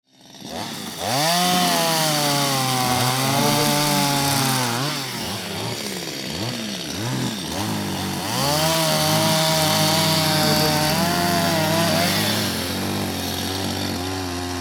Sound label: chainsaws cutting tree